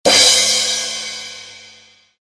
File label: cymbal